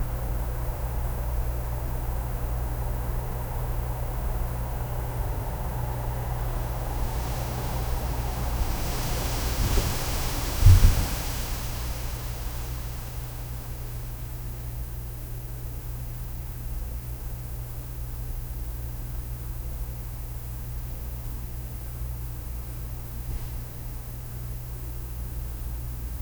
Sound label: soft night breeze through, moonlit